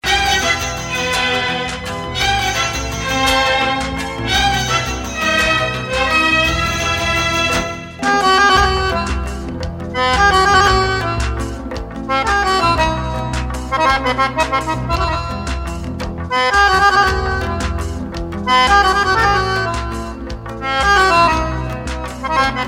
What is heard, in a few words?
Accordion